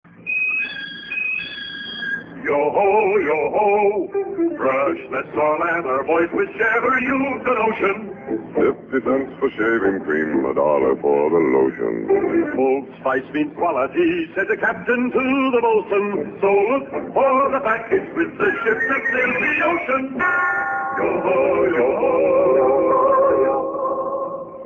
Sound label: old spice jingle; Fragrances; Advertising